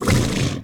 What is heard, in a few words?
Animal Horse Snort Dry Mono